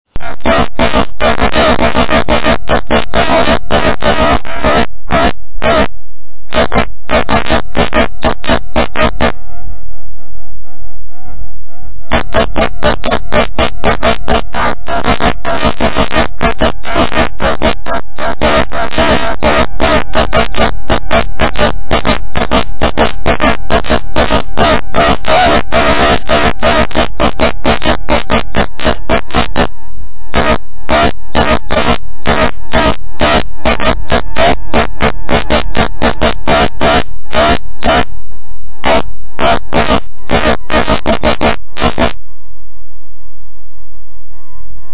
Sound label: melody